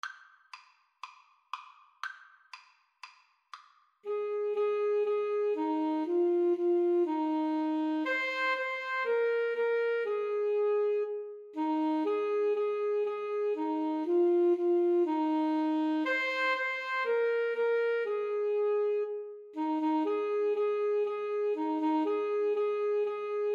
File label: old macdonald 2 A SAX